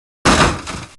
Dwarf Defend Sound

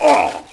Archangel Hurt Sound; Heroes3

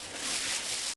Sandworm Move Sound; Heroes3

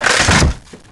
Dendroid Guard Death Sound; Heroes3

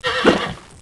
Unicorn Attack Sound

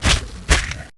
Behemoth Move Sound; Ancient Behemoth

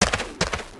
Pit Lord Move Sound

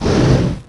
Hydra Hurt Sound; Heroes3

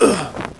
Angel Hurt Sound

